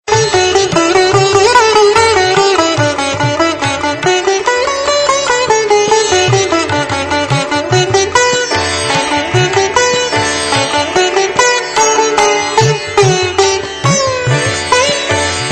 Sitar

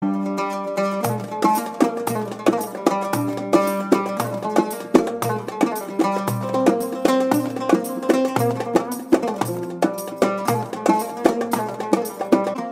Arabic Ringtone

